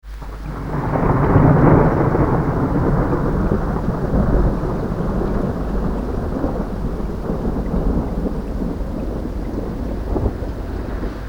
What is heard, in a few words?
thunder